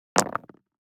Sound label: DICE on Neoprene, Throw and Roll, Standard, 4 Four Dice; Samples; ArticulatedSounds; DICE Sfx Party (Extended)